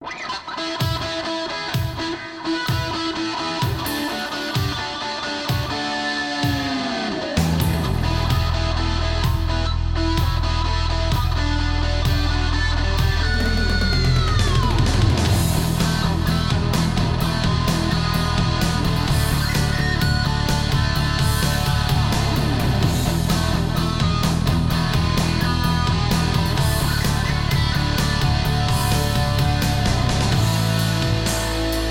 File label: stock music